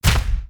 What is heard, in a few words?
Character Gen Bounce Very large; Angry Birds Epic